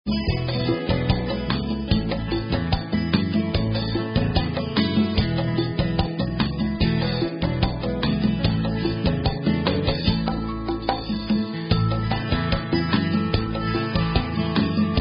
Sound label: Karaoke Songs; Girl Scouts